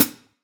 soft hit normal

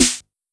normal hit normal

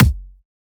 kick; osu

